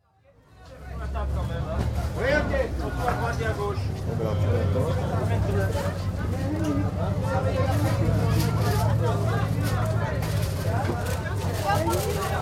France, Versailles market atmosphere in busy street market on sunday morning, with vendors' calling; City Sound Effects; BBC